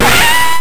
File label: sax